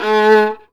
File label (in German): GEIGE; Best Service; Alpine Volksmusik